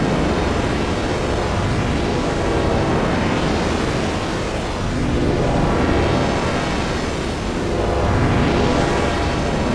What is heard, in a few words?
Sound Exit Game; MiSide